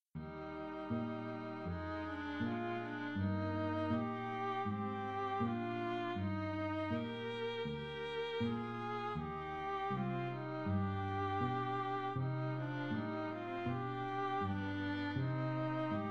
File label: Piece for string trio